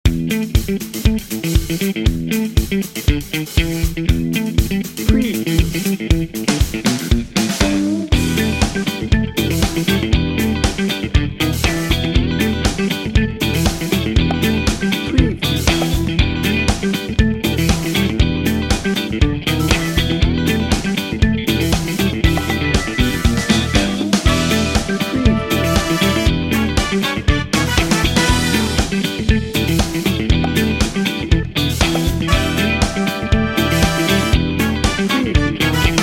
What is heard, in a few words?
funky toes